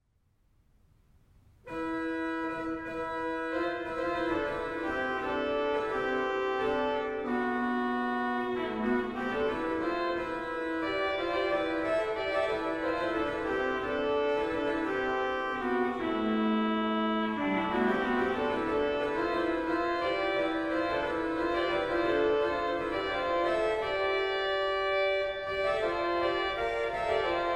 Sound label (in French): Postlude orgue